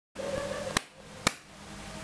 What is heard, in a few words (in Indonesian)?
Tepuk tangan, human